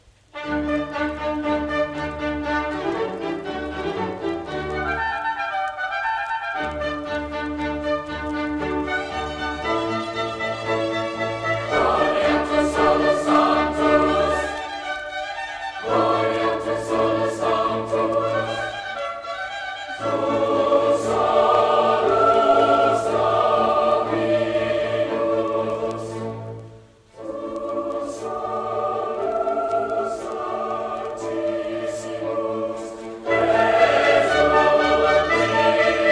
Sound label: Gloria Quoniam Tu Solus Santus; ISOMATA